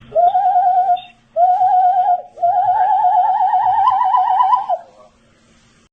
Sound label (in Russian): svist; mellstroy